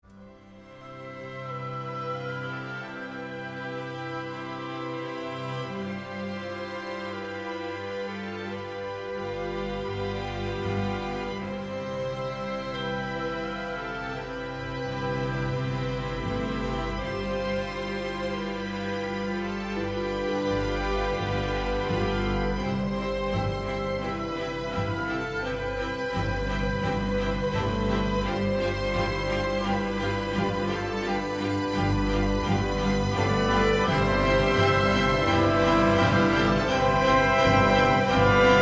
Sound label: Epic Theme Crescendo